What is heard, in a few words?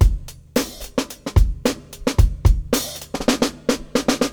Funk 111bpm